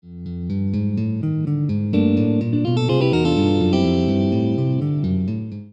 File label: chord